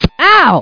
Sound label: owwwh